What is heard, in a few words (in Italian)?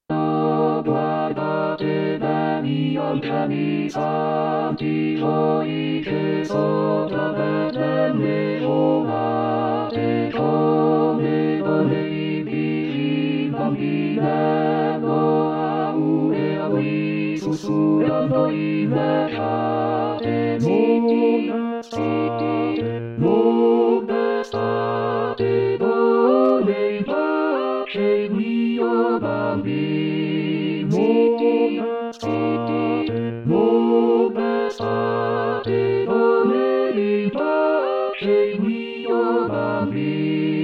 Coro; Oh Guardate Begli Angeli Santi; natale